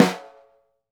SNR, Synclavier Percussion 2 - World & Orchestral

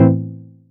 Chord; ModelCycles

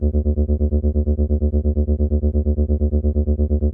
LOW LEVEL, Anime Sound FX, SCIFI; AKAI Anime Sound FX

